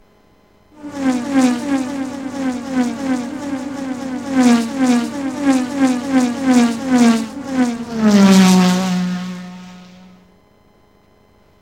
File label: Car Race